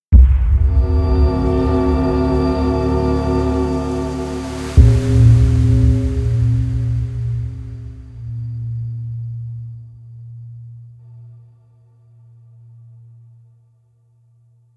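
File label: sound music death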